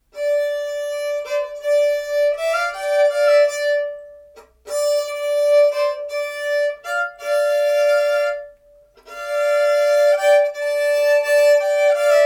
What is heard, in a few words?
Drone D